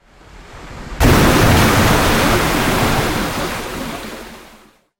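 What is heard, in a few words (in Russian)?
moshchnyy islandskiy geyzer uragannyy rev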